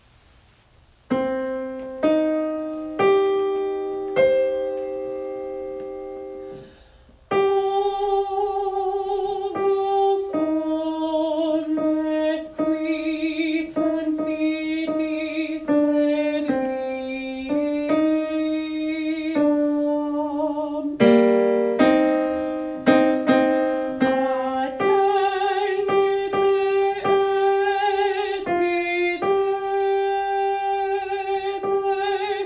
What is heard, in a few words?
alto, choir; holy week choir practice tracks